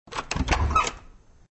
door sound

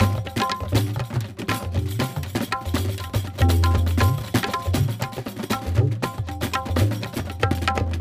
music action percs; rayman origins 2011 gamerip; music world

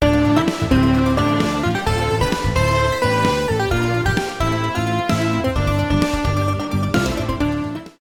Ghost; 3souls; wii u

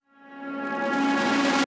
Overlay Dark

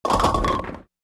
Pin Crash

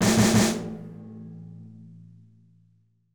Jingle Start

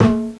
Sound Drumbeat

